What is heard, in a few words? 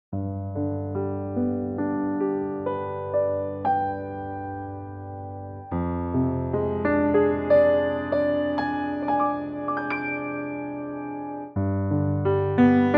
G Piano; Pianotrak